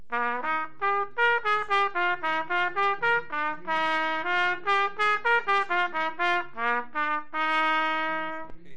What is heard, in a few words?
trumpet